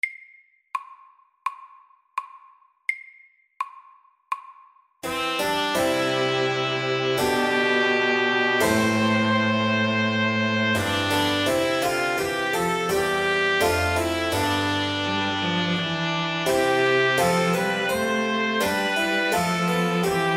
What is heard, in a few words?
March SLOW